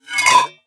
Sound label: place valve; buttons